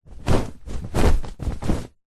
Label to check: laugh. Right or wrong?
Wrong